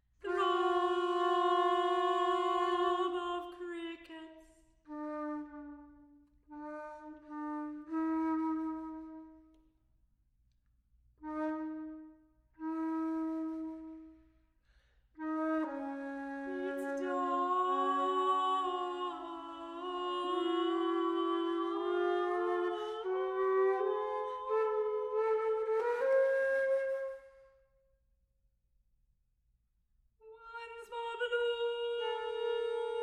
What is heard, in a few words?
Throb of crickets